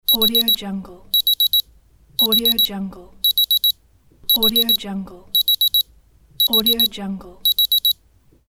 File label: digital clock alarm